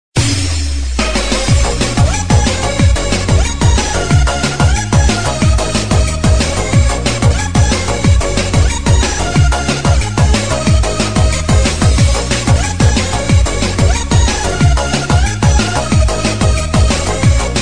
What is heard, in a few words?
dj rock mix